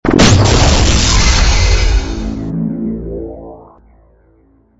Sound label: ar2 hit